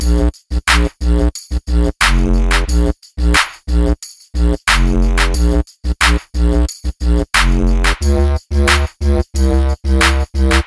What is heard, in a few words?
Didgerdoo Dance Bpm 90